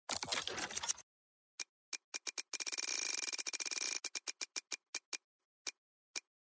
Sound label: bonus roll